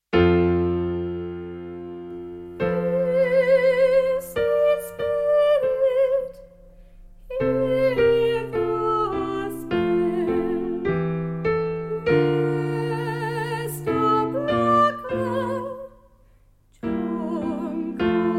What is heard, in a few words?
soprano solo